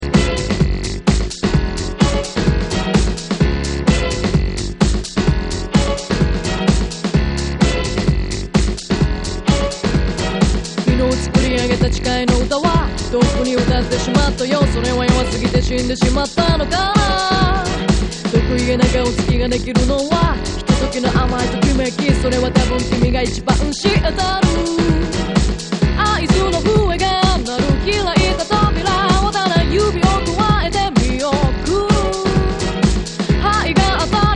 vocal

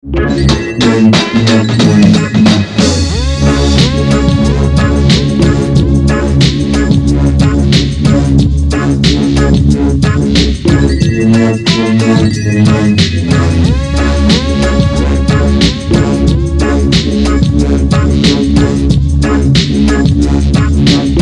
Sub Skank

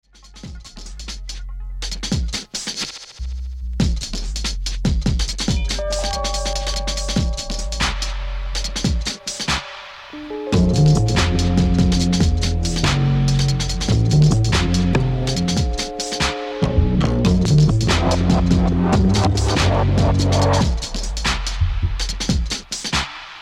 Magic Harp

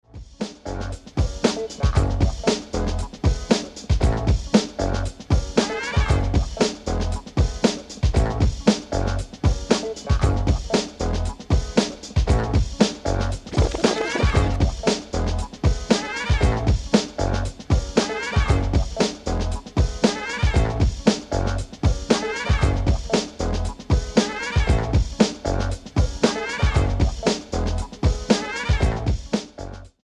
Disco Boogie Beat

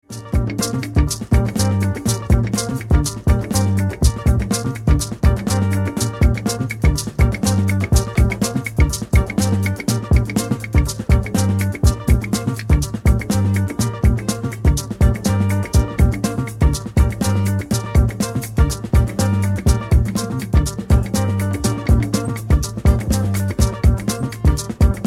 Afro Disco Beat

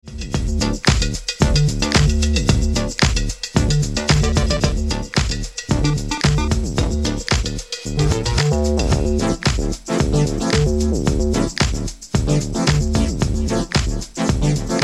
Drum lessons, Rex Drums

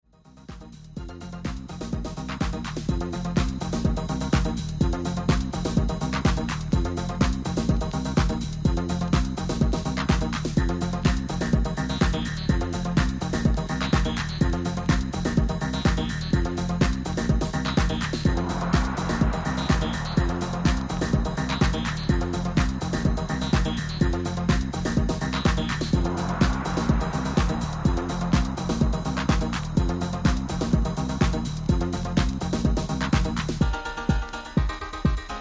Subsonic Trance

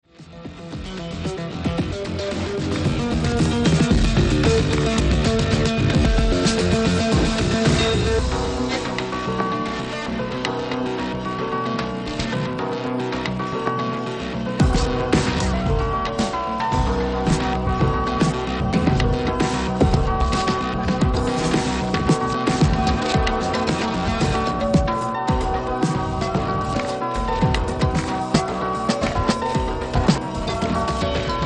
Arpeggio

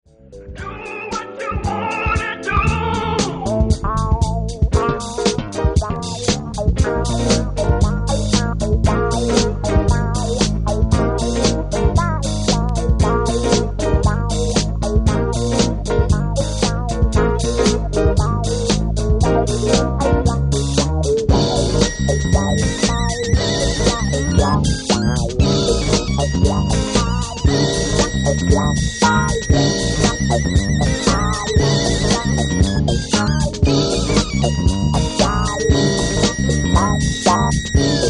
Wood Brass & Steel

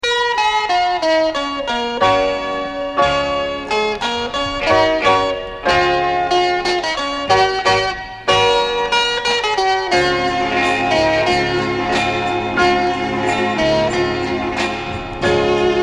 strings

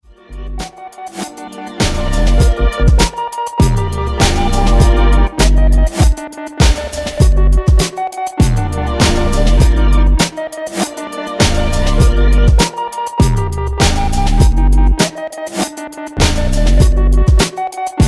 Moog Jam

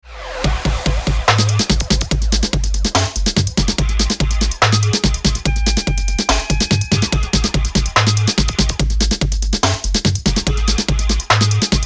bass

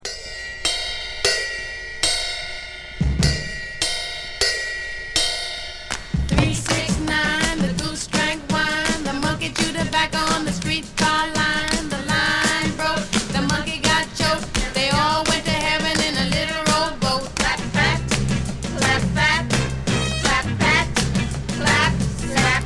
clapping

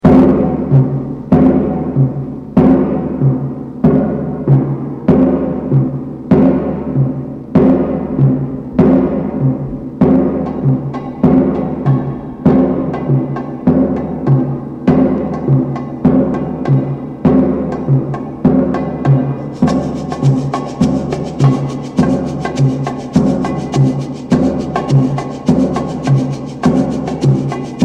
batucada

